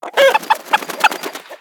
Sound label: chicken fly long; ambient; creatures